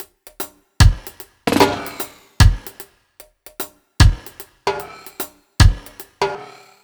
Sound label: BT, Ragga & Dub Reggae; USB Soundscan vol.27; AKAI